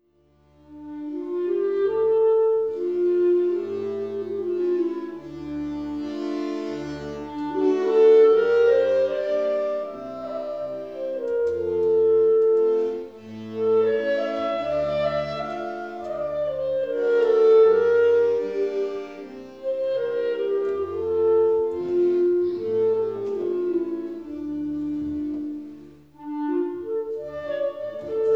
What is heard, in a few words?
Klezmer Ouverture